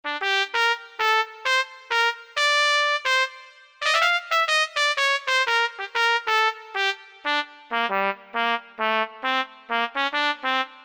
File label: Trumpet